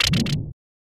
Whip

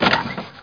Crash; Buggy; PS1

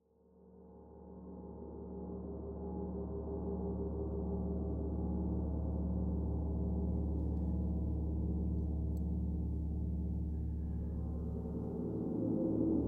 Drum and Harp; Drum and Harp Mood 3

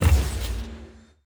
Ui Select resource Stereo

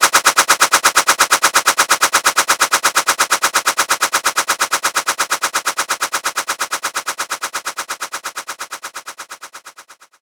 swords

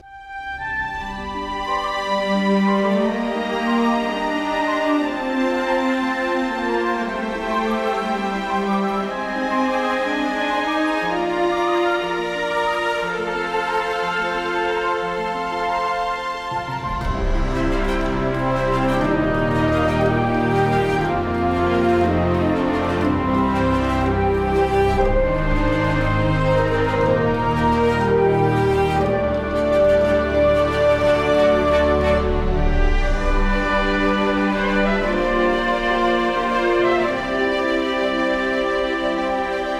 Victory Menu Screen

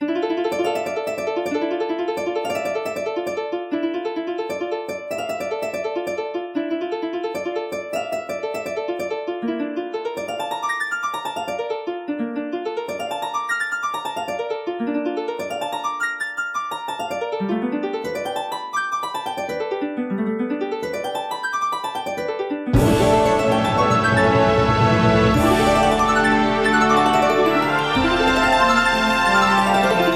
With Harp; the legend of zelda skyward sword wii gamerip 2011

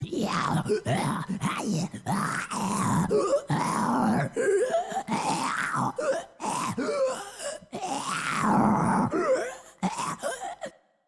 ZOM SOUND

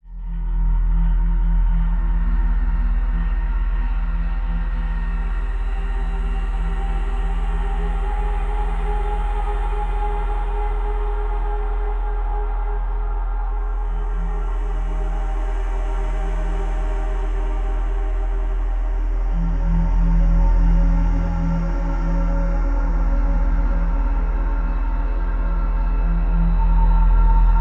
Dark Ambient; Layers of Fear

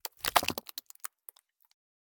Gore One Shot; pulling no punches

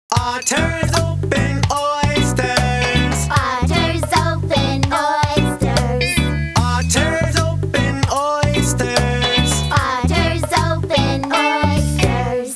Song Letters o O S O N G; School; Fisher Price Ready for School Kindergarten

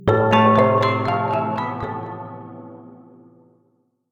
Loading Screen

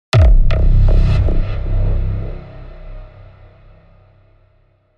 Cinematic Impact

